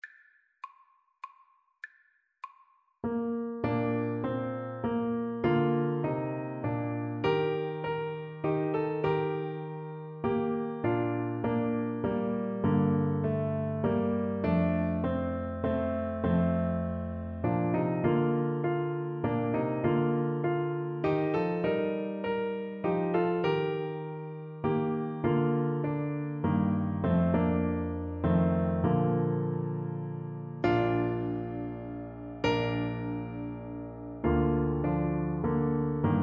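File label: welsh national anthem, Trombone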